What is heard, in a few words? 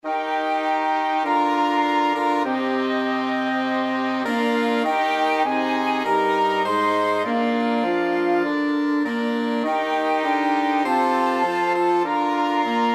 flexible mixed ensemble, 4 players